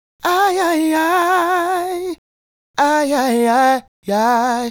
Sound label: Male Solo, Hip-Hop and RnB Vocals